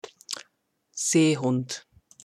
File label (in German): Seehund